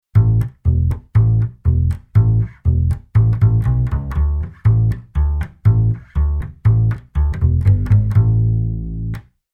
Bass Arr Country Bass

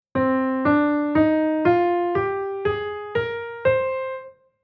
C Aeolian

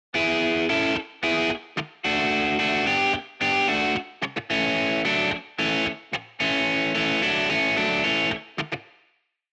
guitar pattern 2 gt

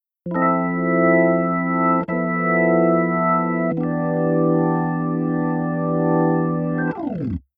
Keyboard Org

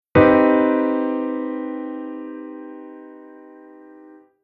Chord mMaj7

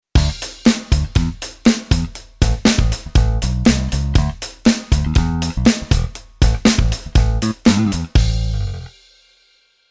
Bass Arr Funk

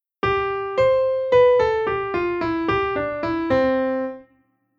transposition and modulation

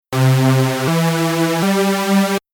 synth mono; leg off

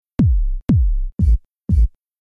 HipHop Kick, Rhythm

